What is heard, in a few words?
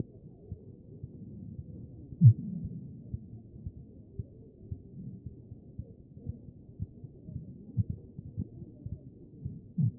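anterior chest; B MODE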